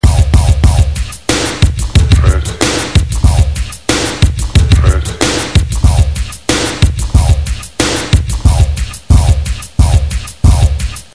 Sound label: loop, Ensoniq Mirage